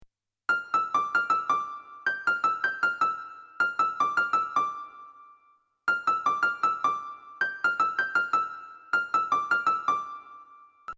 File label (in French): piano aigu